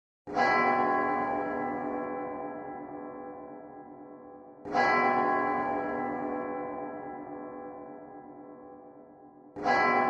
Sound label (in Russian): Kolokol